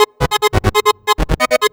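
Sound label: synth 138 G#, hooklines, Techno; Techno ID; instrument-loops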